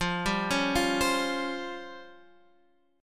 Db Major 7th Flat 5th, F, guitar

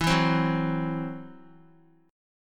E Suspended 2nd Sharp 5th, Guitar, Esus2#5 chord; strummed

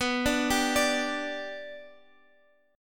B Minor Sharp 5th B Guitar Half Step Down, chord

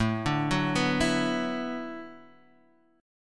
B Minor 11th A

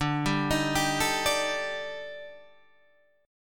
Eb Major 7th Flat 5th D

